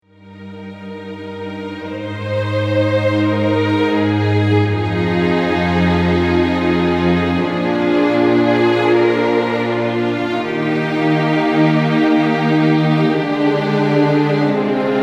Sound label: instrumental